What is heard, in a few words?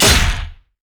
basicattack dragon; LoL; shyvana